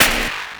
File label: red lightning zap